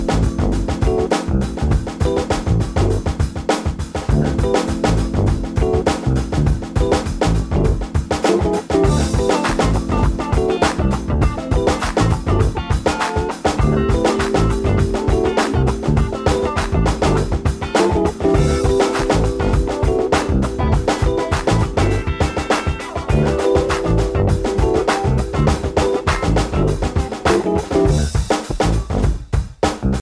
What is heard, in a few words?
Drum